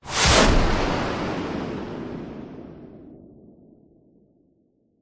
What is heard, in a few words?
missile small launch designed